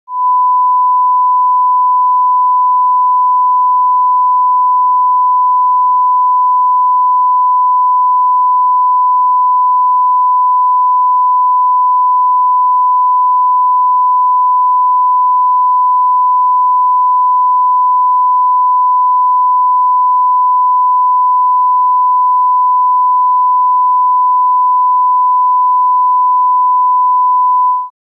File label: 1 KHz - 0 dB - Right; Channel Separation; The Sheffield - Coustic - Set-up and Test Disc